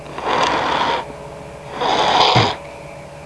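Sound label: blown nose; People; Sound Library 2000; Wayzata Technology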